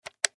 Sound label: Button Switch; Multimedia Web Interactive; Buttons (Sound Effects:Multimedia